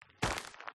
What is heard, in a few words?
Single Step Footstep; PMSFX